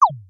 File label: Frog Jump